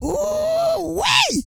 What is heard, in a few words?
E YELL, MALE HUMAN; ILIO; Vocal Planet